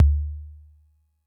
kick; MFB-522

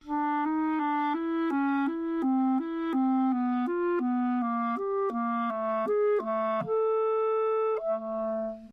clarinet D4 phrase mezzo-forte tongued-slur; solo instruments

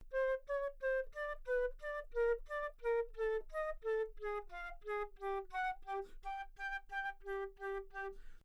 flute C5 phrase mezzo-forte nonlegato; solo instruments

